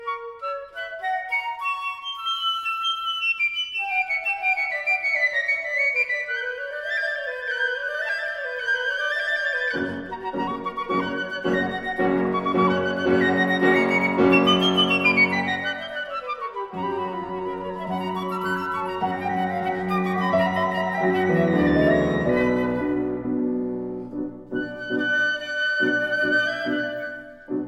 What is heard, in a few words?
piccolo